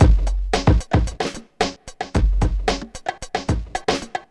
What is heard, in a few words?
bongo 112; Zero-G; Funky Elements